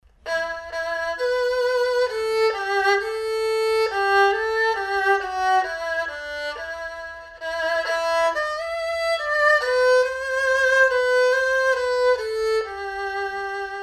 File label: erhu